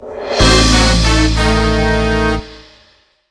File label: Raid UI Result Fail Jingle; cookie run